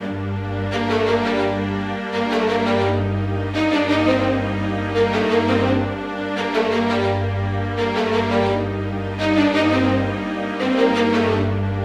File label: cinematic strings; gerilim; By Burak Sound Bank; tension vol 51